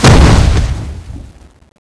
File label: death; CSO Cronowing; cronobotics54